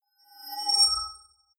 appear twitter

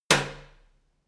letterbox flap close

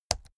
keyboard laptop key press slow hard

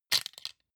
plastic frame small hit rattle

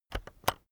keyboard external enter press slow heavy

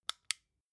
flashlight on

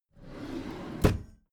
packed drawer smooth open regular

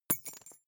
key ring small drop carpet

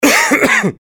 cough male

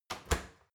oven close soft

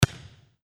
volleyball serve

